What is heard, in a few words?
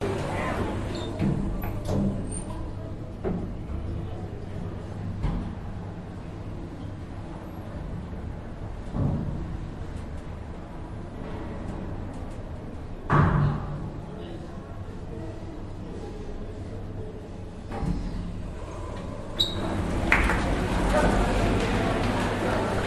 Markethall Elevator Ride Fuzzy Walla People Chatting And Walking In Distance; Budapest Hungary; your free sounds